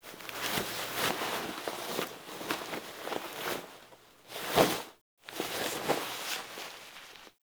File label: Wake Up Stand Foley; Warframe; Sacrifice; Sacrifice Arsenal Wake Up